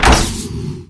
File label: Vehicles Hoverboard